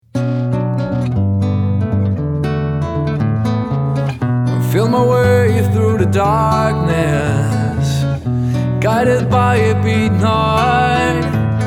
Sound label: Pop